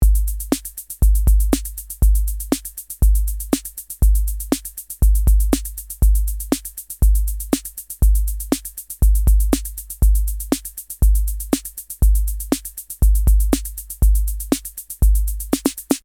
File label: Clef Straight Beat